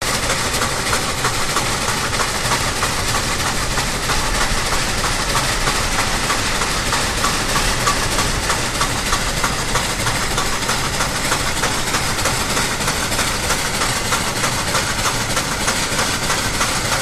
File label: Train Pacing Stdy, steam train